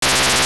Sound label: Shock; Link getting an electric shock